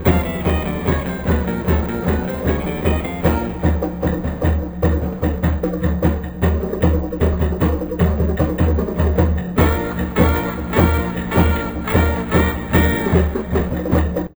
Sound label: Timbales; SpongeBob's Next Big Adventures